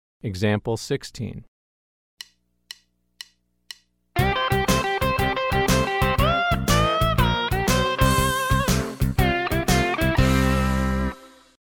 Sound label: Country Rock